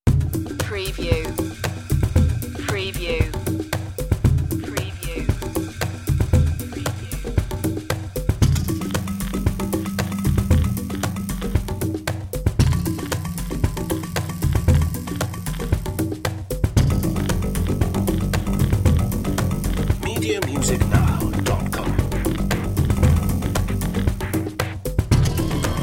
african voodoo

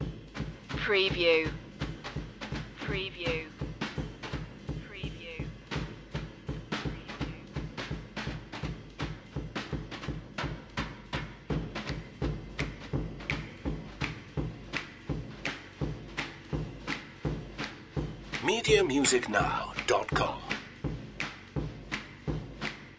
fixin to score loop; screwball comedy

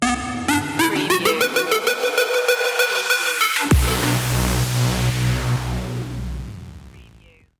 echolot stinger

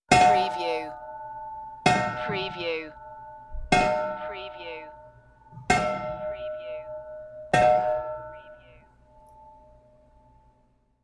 Big Old Bell Multiple

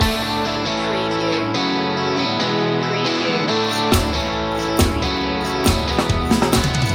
encouragement loop

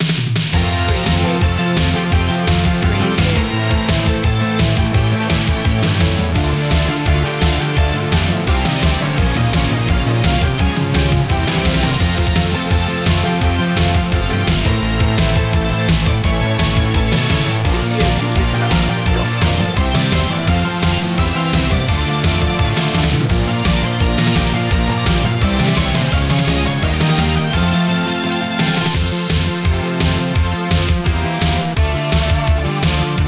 j-pop victory no lead

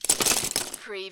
Lego, drop several pieces into lego box

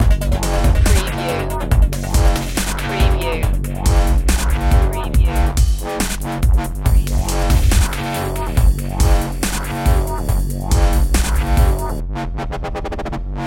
inevitable drum loop

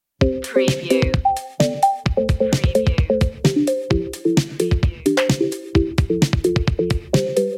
electro cool loop intro; media music now

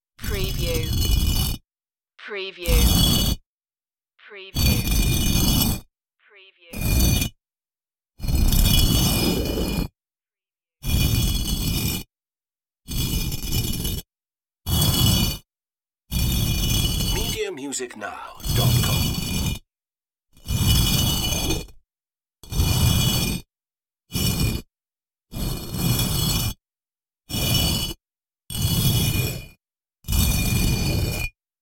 stone slide

